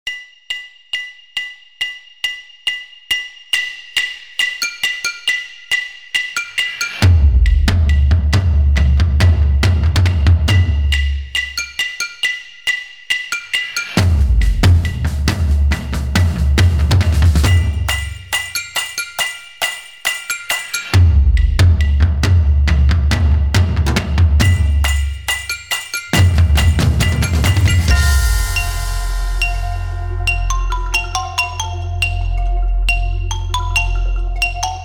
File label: hammer; MARPLE